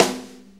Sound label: 6-8 Fill, Drum Tools; Sonic Foundry (Sony Creative Software); Loops & Samples Drum Tools; Fills